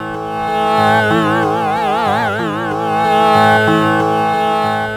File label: World Lick